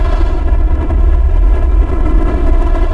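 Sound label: fast loop, engine; ships; Quantum Redshift; XBOX; kumiko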